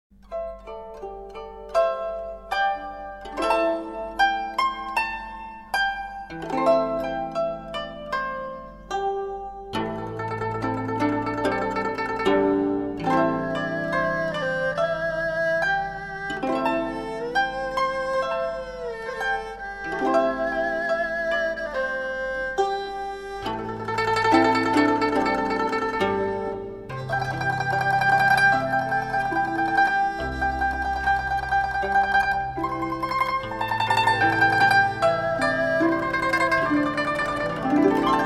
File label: Gu Zheng; Lao Duang Dok Mai